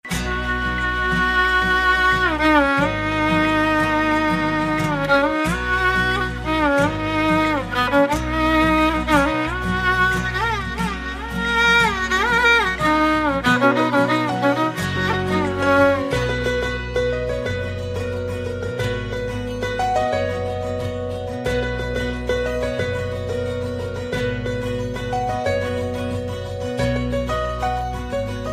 violin; alternative

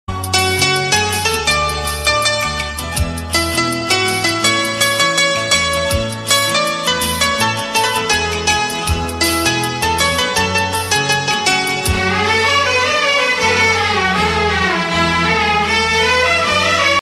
instru

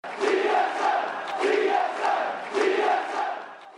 Notification

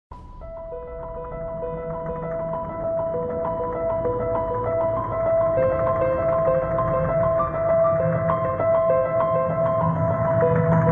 Scariest Piano

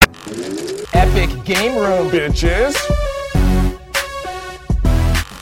Suspense

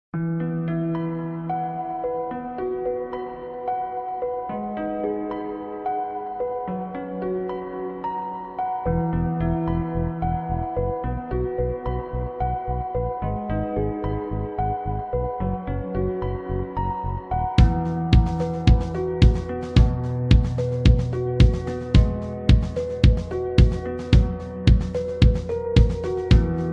Magical Tone; Iphone

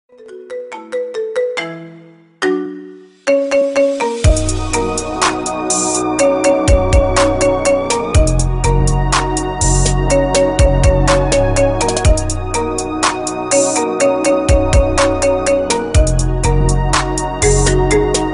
Marimba

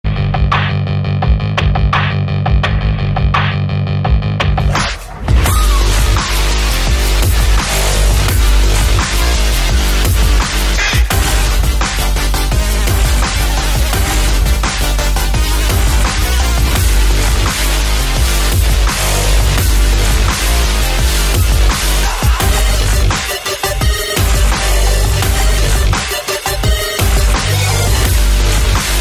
dubstep renegade

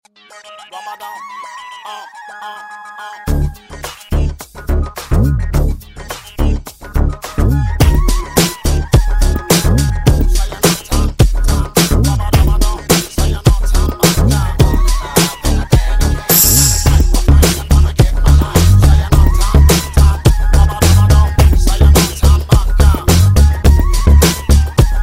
hip hop

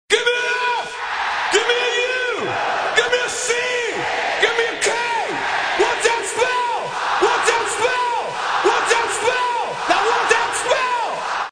spell; ringtones; sms

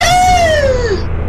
scream

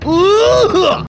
Cough; Shenmue

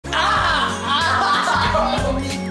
waxing pain

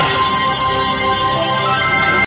Slot Bonus

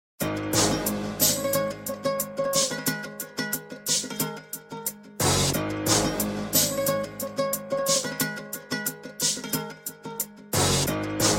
black beat, hip hop

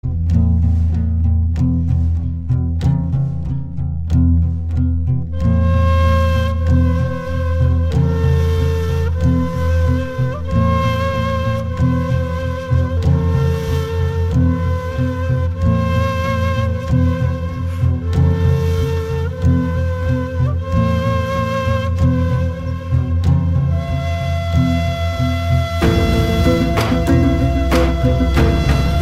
ney, ringtones; alternative

